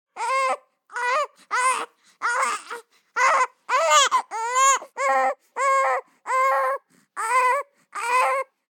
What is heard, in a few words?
BABIES WEEP Boy 3 months; Baby Boom